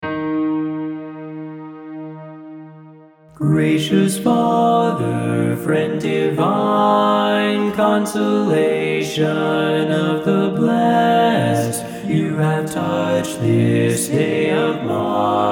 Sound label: BASS